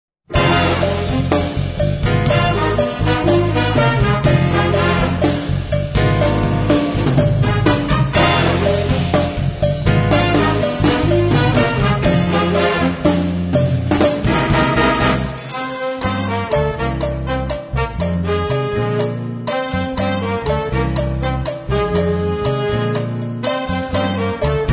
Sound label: FUNKY CHA CHA